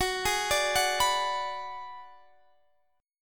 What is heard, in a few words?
G sharp Minor 7th Flat 5th F sharp